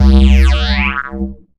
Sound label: Single Electric Basses, Dance Floor Bass